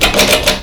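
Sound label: door knob; doornob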